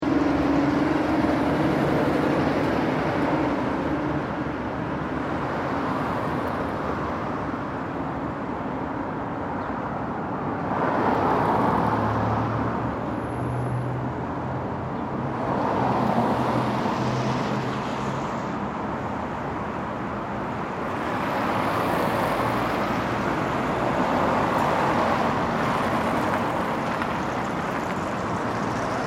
Traffic Street Noon, Semi close recording of cars passing by; Early spring birds in distance; People walk by